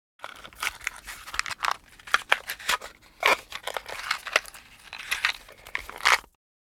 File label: Matches box handling open pick up a match